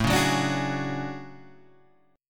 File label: D-Major Sharp 11th, A